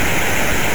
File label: crash